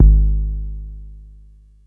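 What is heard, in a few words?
Long bass drum, Jomox Airbase99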